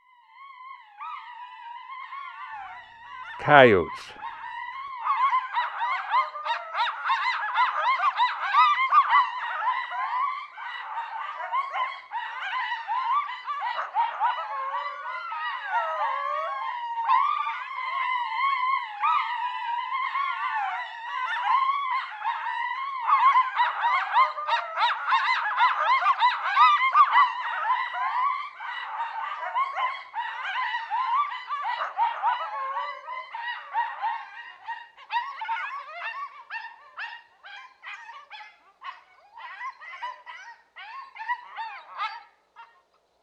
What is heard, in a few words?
Coyote; Dan Gibson; Wildlife Identification By Sound